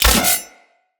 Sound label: Spin Attack Hit; Corpus; Arachnoid Micro; Abilities